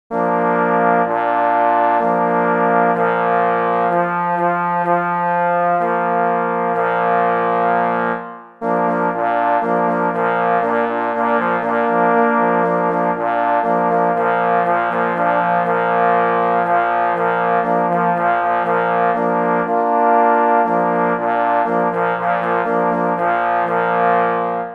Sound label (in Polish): na 3 parforsy; K.M. Kadlec; Na czesc czerwonego psa; pies w muzyce lowieckiej